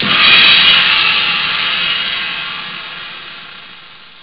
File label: crash 1, drums misc; OctaMED SoundStudio V1